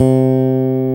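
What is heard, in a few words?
5 string, bass, P bass; Roland L-CD701; Jazz Bass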